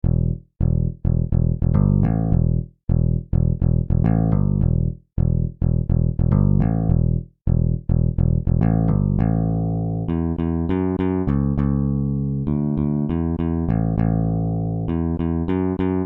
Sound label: bass; tcc spirit bass demo